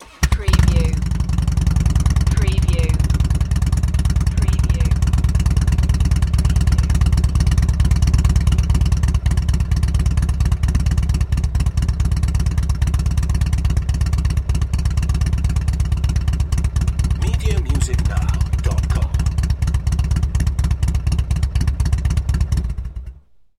Motorcycle Harley Davidson Start Up Idle Shut Off Exhaust Perspective